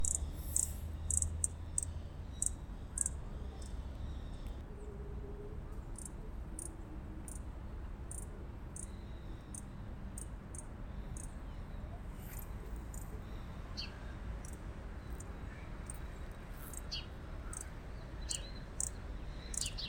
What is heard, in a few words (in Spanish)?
Picaflor Bronceado (Hylocharis chrysura)